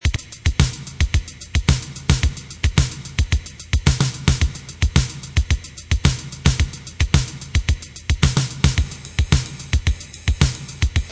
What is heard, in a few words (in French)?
Style Latin, batterie; Cours de batterie; Exercices